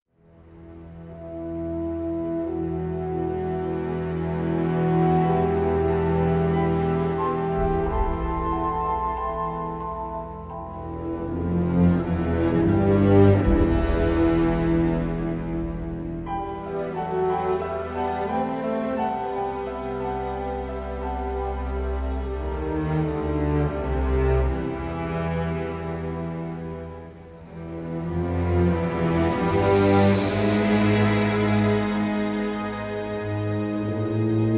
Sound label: location spooky explore day